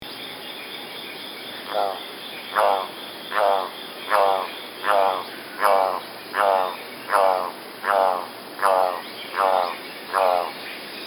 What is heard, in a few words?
golden collared toucanet